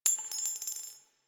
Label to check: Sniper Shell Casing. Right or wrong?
Right